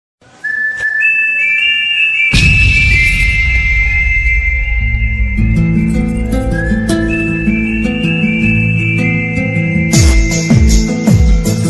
flute ringtone; paglu movie

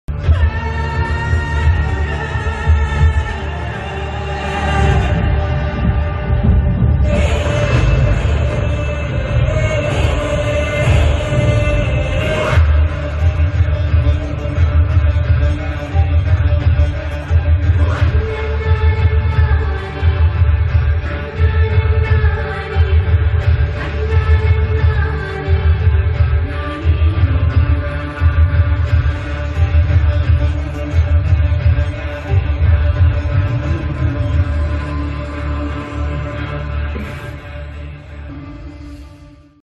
movie bgm ringtone; a r m movie

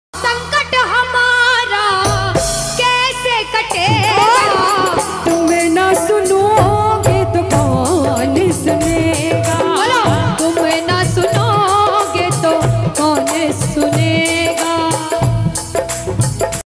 lofi ringtone; khatu shyam

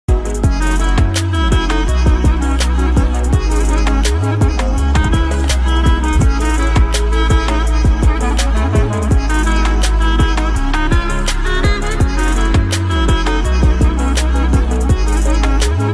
ost ringtone background music; kaffara

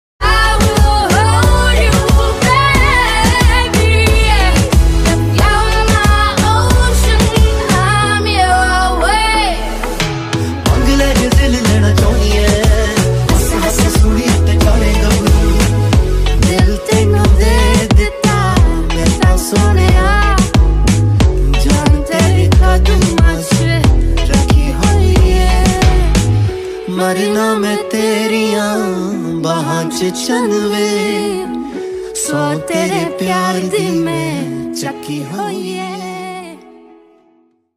new punjabi song ringtone

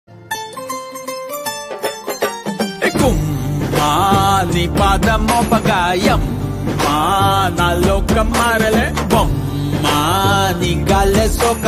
marriage band ringtone telugu ringtone; ambajipeta

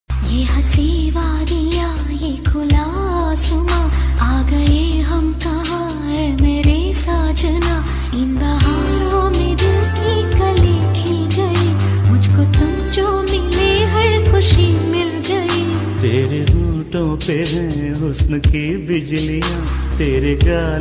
female version

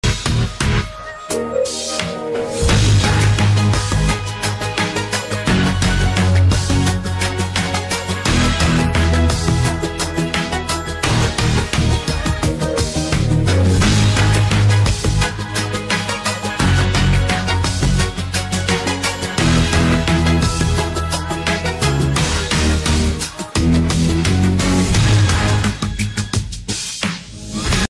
dance intro ringtone instrumental; deadpool

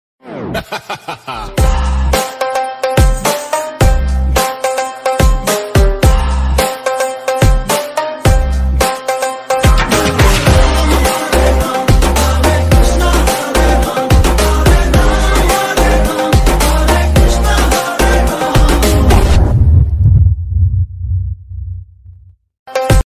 bgm ringtone; bhool bhulaiyaa 3

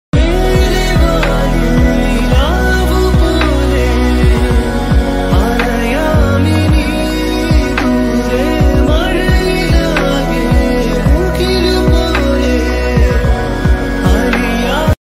ringtone tamil malayalam best girl ringtone

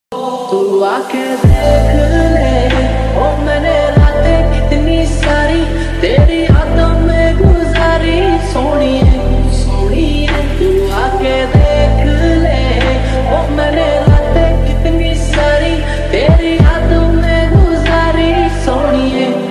lofi ringtone

